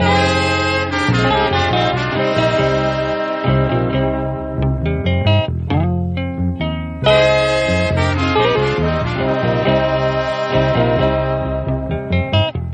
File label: horns, phonky loops